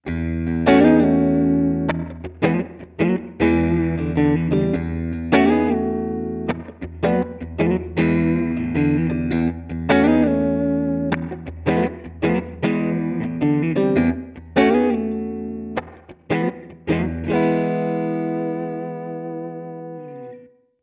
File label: Valeton GP 200LT Little Jazz